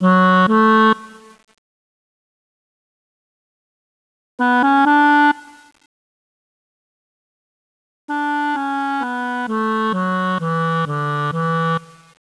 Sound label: Clarinet, Woodwinds; Clarinet 2